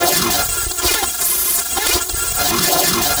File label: HYPER WARP; Spectrasonic Distorted Reality 1